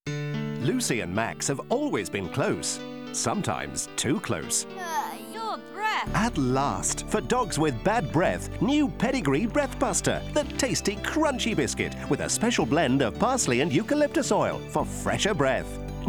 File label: breath